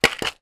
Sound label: small plastic pot nuts down on surface; industrial